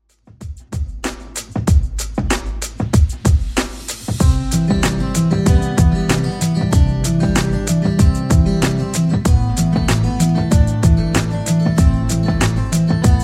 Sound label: 70s Redrum 95bpm